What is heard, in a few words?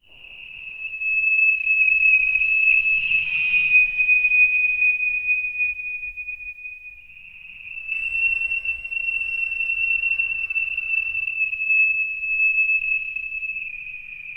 stereo high quality hell